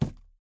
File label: phys cube, physics